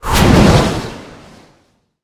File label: taunt; smite; god merlin